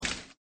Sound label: CAMERA